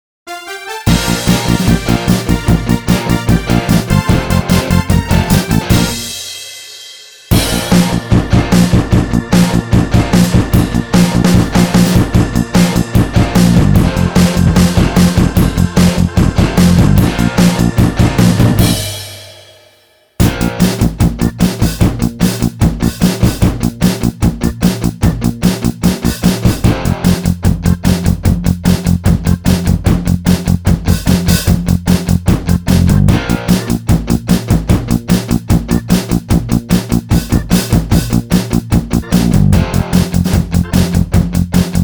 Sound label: where is mr. monkey karaoke